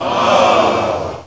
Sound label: OHH